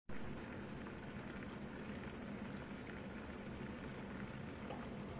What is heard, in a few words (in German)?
Spuelmaschine